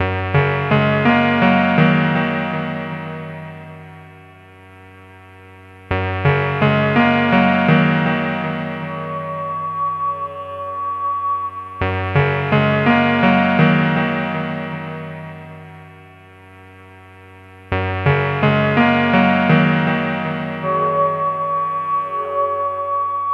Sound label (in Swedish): ring